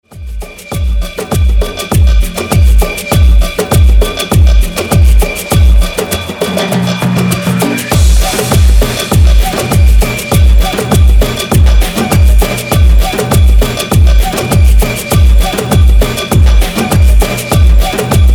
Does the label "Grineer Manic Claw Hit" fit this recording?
No